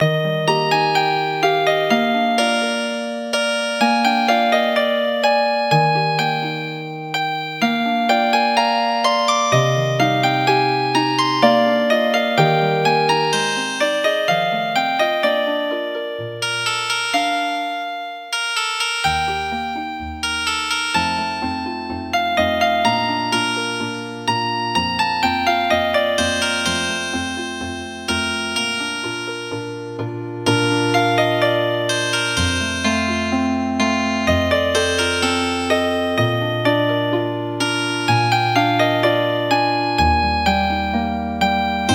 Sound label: Healin Harp